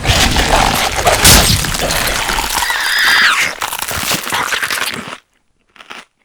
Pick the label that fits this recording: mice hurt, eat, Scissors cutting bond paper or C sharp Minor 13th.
eat